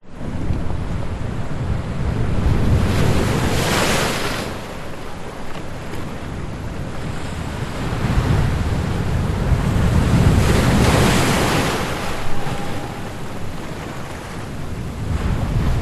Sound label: Waves Medium Beach Windy Hissing Water Running Between Rocks; Skyes Audio